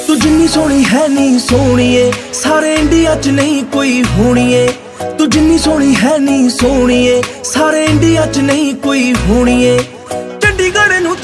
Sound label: Bollywood Song Ringtone